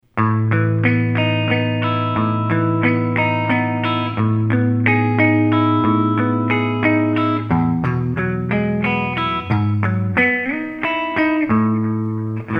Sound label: Electric; Ambush